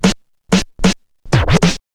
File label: huge steel snare drum b key, snare sound tuned to the B note